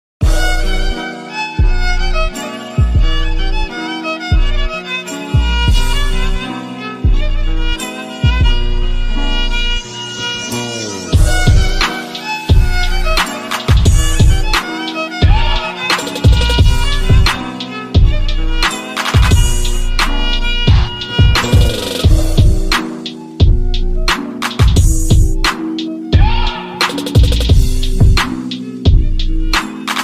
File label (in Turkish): Telefon Zil Sesleri